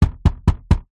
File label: plastic panel knock hand x4; foley; zapsplat